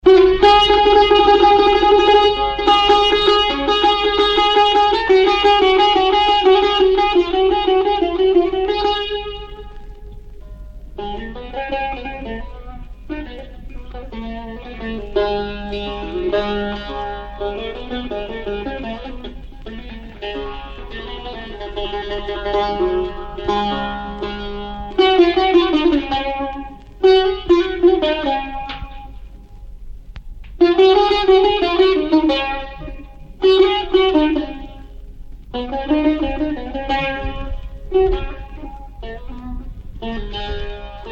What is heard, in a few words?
Solo saz